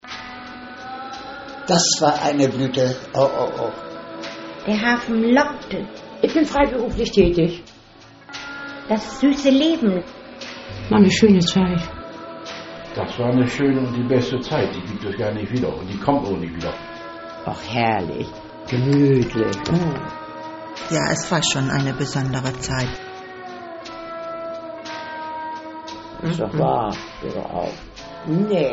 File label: jingle; cantina